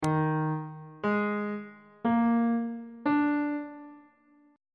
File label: d sharp major 7th suspended 4th chord intervals on bass clef